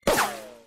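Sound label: ricochet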